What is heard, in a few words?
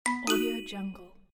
positive action